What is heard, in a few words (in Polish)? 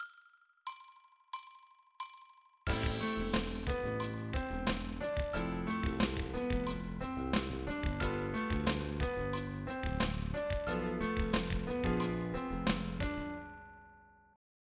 Z podkładem